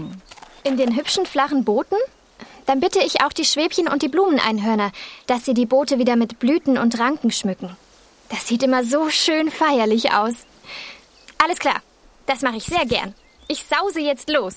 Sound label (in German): sprachprobe gesang